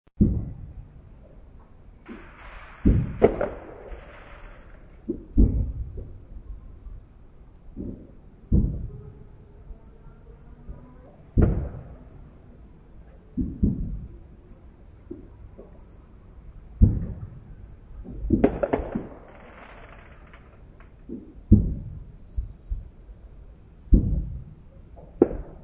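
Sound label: Fireworks Distant, Fireworks in the distance; Part of Fireworks (Sound Effects:Backgrounds)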